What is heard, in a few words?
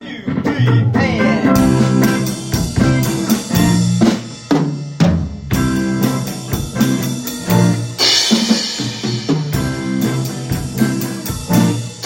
Ending; shaky hand